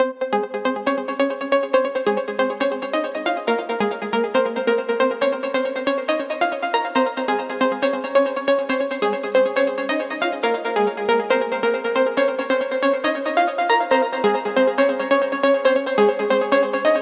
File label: Fusion Kit 138BPM Main melody WET, A# minor; Unlooped; Vengeance Samples